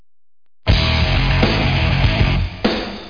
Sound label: riff